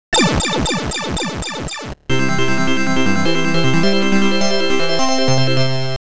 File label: Game Over